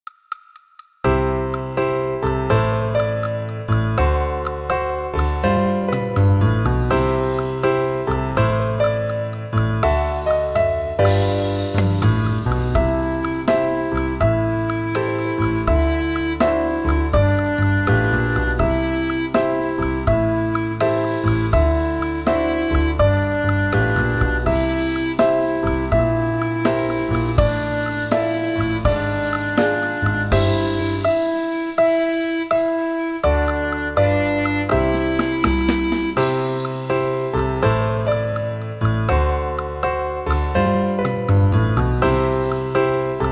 clarinet

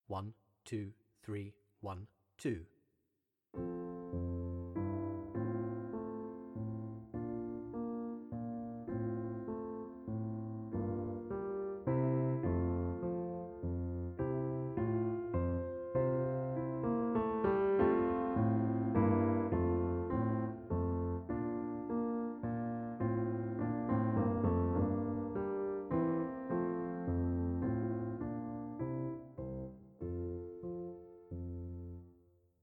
menuet

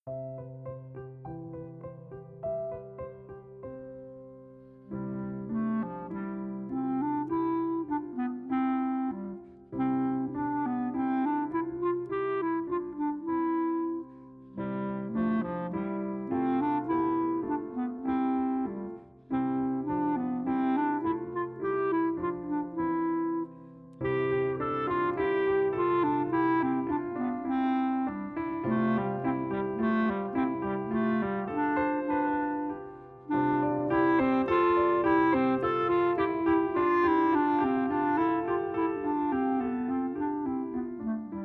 gavotte

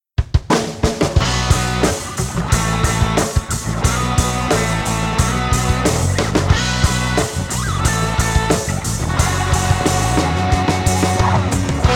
flute

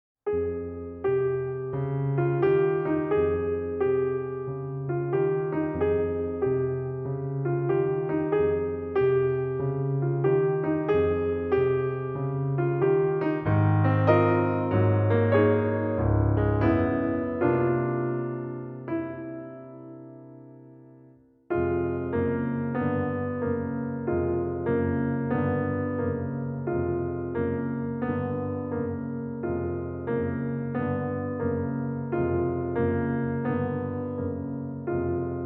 trad english Eb accompaniment accompaniment only

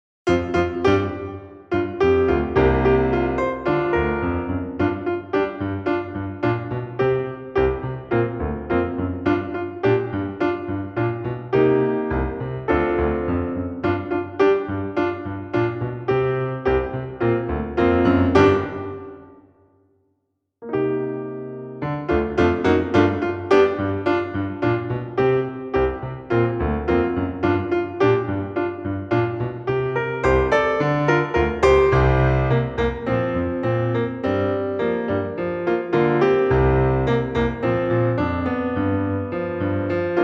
Eb accompaniment accompaniment only

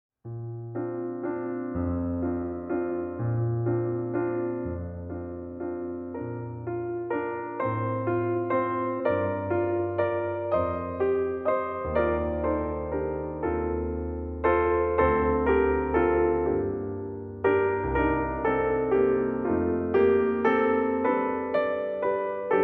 waltz Eb accompaniment accompaniment only

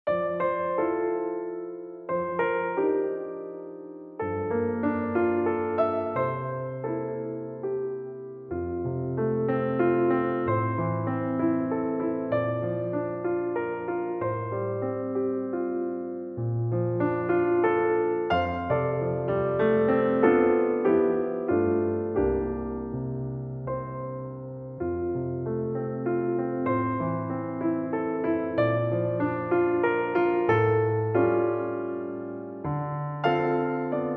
accompaniment

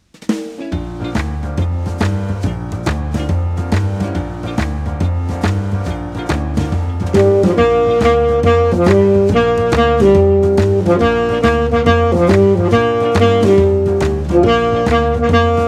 sax

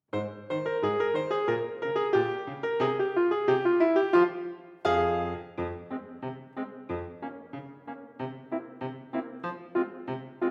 polka accompanyment only

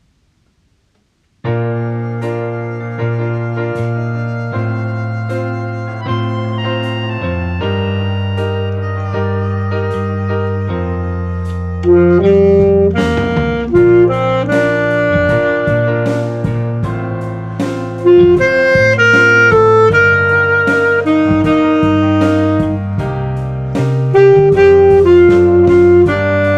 sax

